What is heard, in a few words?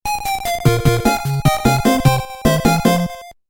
game over